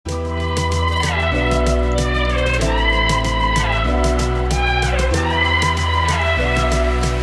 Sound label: remix